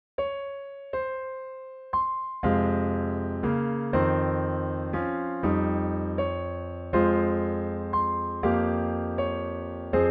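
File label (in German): wasserklavier plain, piano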